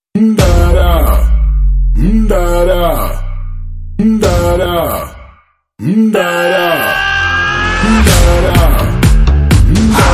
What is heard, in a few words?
Theme Ringtone